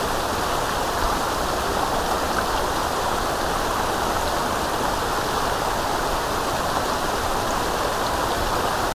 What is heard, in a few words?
waterfall tall; The Sims 3; Windows